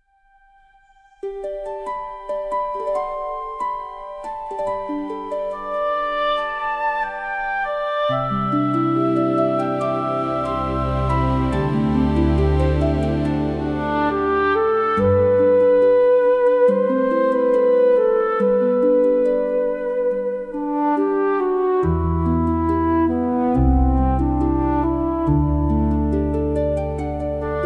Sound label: fantasy music nature forest